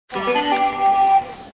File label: train